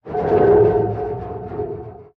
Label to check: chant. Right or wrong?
Wrong